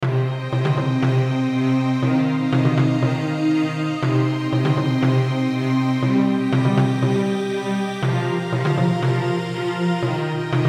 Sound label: Absynth